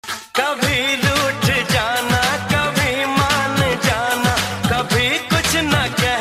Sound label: Old Ringtone